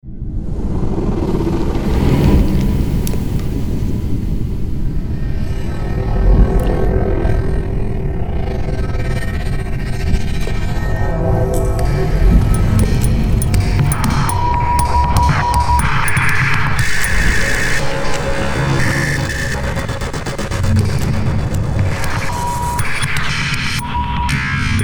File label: Bad Mood